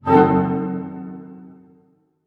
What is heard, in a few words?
HARP HITS F# DM, Orchestra; Sound On Sound - Peter Siedleczek's Orchestra